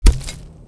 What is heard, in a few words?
grass; Mafie 1